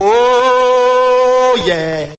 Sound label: "oh yeah" sung